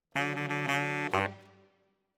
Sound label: Bari, RNR for piano